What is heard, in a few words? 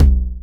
TR-909 Tom Low; drum machine; Roland TR-909